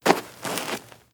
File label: Dirt Turn; moul-assets